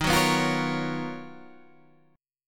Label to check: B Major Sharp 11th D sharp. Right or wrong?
Right